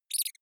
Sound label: mouse squeak